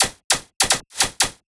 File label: Drum Fill 150; spectrum; Microwave